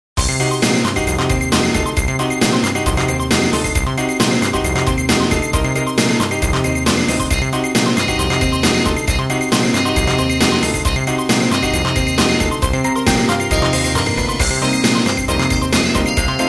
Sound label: battle; black magician battle